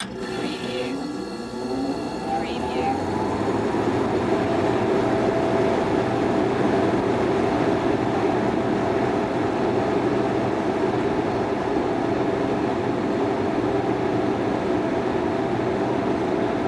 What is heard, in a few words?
Drinking Fountain; Sound Effects Bible